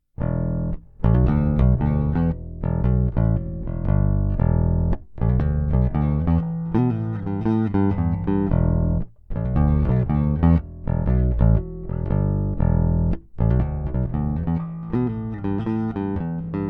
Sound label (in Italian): plettro tono aperto